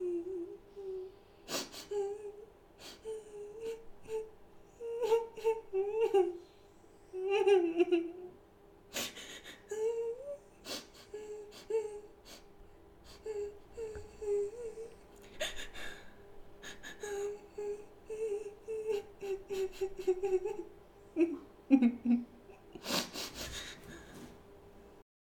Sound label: Woman Crying Softly